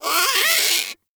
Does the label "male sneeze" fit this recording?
No